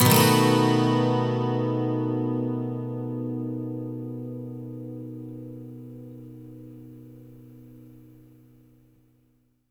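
12 string major B, Electric & Acoustic Guitar Loops; USB Soundscan vol.04; AKAI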